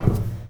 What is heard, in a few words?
metal slip